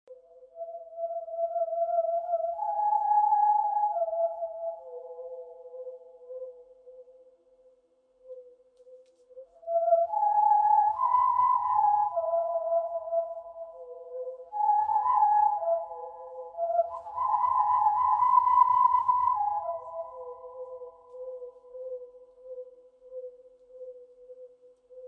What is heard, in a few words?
windpipe